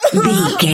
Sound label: voice female hurt pain choke